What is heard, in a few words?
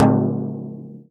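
FRAME, INDIAN PERCUSSION; Big Fish Audio - Didgeridoo and Other Primitive Instruments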